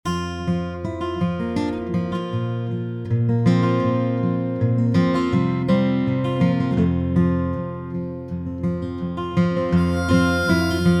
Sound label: Guitar Harmonica